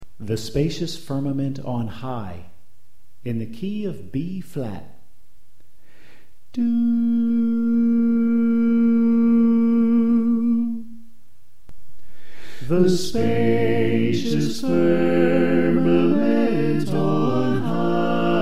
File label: Soprano Left